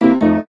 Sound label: 8bit piano hurt